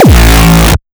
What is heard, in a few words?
Kick Drum; Kielecki